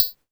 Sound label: polyvox perc, Polivoks; Formanta Radio Plant